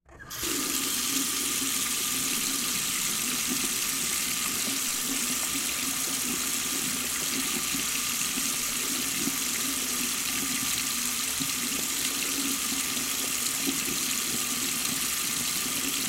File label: Sink Bathroom, Sink Turn On Run Off Drain Water Handle Squeak; Household; Sink Turn On Run Long Off Drain Water Handle Squeak